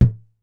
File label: Balloon Bounce; SteamVR; InteractionSystem